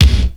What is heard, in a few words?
Kick, Drums Percussion; Zero-G; Total Drum Bass